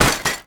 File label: bike land; Golden Crayon Sounds